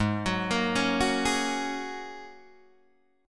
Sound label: Ab 7th Suspended 2nd Suspended 4th, Guitar, DADGAD